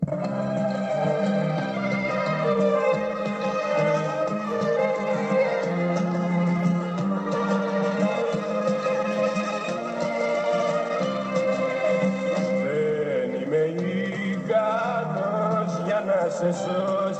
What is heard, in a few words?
transition break segment